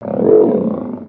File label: Pet Trike Idle; creatures pets